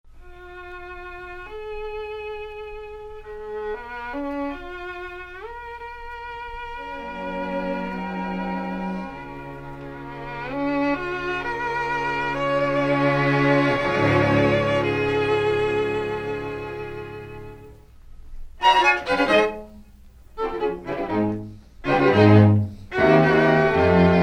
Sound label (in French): Quatuor à cordes en ré bémol majeur Andante Allegro; DOHNANYI; op. 15